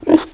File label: piglette collision; Angry Birds Space